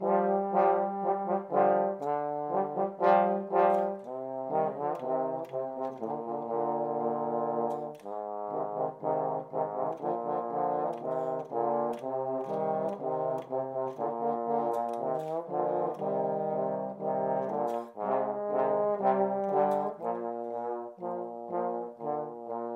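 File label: trio with percussion, rock; soe ensembles bk1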